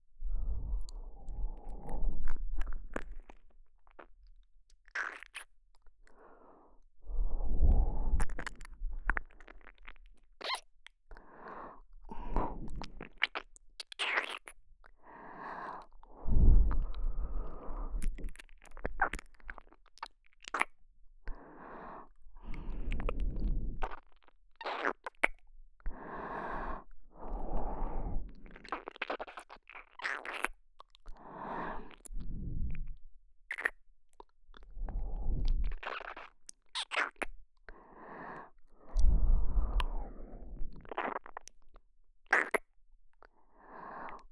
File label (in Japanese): ちゅっ…ちゅっ…, 右耳